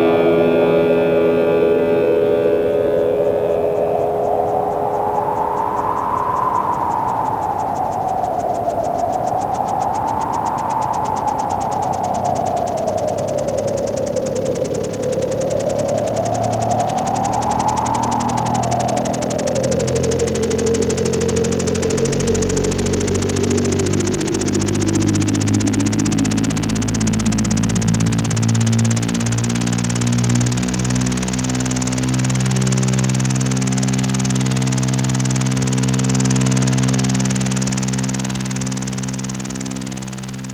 FX apocalyptic; Sonic Foundry (Sony Creative Software); Ugly Remnants VOL-2; UR 2 FX (Long)

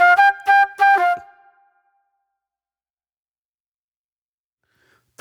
J-Funk Flute, Call And Response

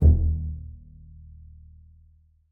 D#1, bass pizz; Xsample VOL-5

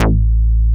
A-BASS, AN BASS

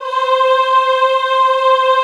EHH C4, Classical Choir; Best Service

